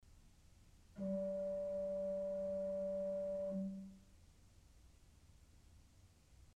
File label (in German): Principal 4' G, ORGEL pipes